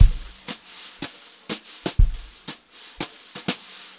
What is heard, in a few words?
120 JZ BEAT, Brushed Drum Loops; USB Soundscan vol.39; AKAI; JAZZY